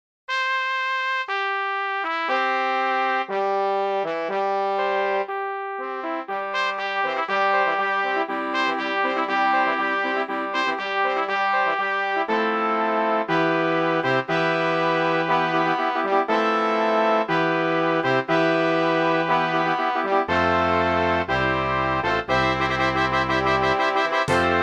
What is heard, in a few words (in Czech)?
Fanfary